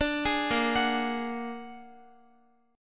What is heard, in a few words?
Bb Augmented 7th, Guitar; Bb+7; arpeggiated; x,x,12,13,10,13; 4E,4A,5D,5G,5C,6F